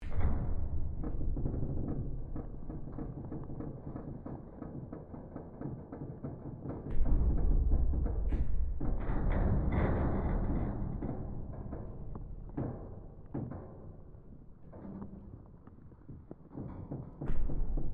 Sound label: Created Ambience Ship Hull Creaks Impacts Shifting Ice Loop, Created environment inside a ships hull; Created environment inside a ships hull (Spaceship or Seaship); Artificial Sound Effects